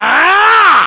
melee attack, english

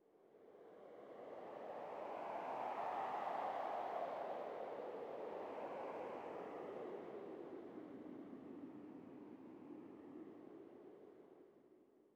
sting wind gust; Rust

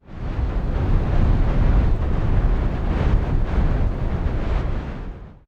ambient battle; World Of Warships

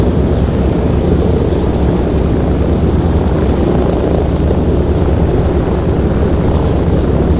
c46 rear, engines; War Thunder

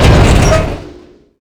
mohole slam

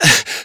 breathing hurt; Call of Duty Modern Warfare

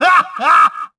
hah hah

